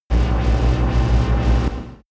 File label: space driving construction machine heavy